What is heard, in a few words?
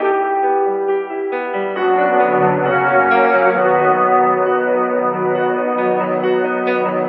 horns and piano, Sad